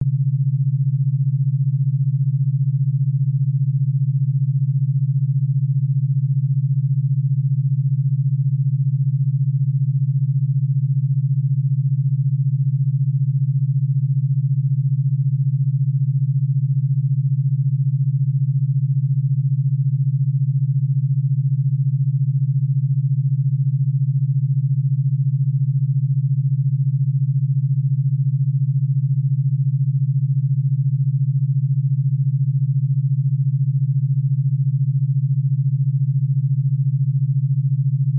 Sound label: low beta, binaural beats